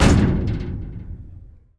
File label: Loop Crane Drop